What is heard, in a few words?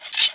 sheath; thief